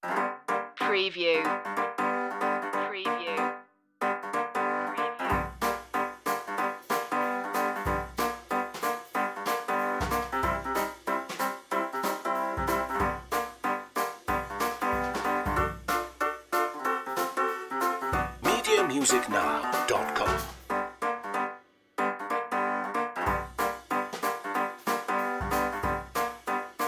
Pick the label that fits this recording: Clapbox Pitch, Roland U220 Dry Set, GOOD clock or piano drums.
piano drums